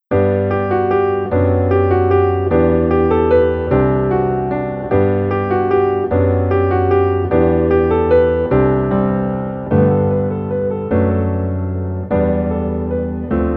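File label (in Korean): Piano version 멜로디 MR -1키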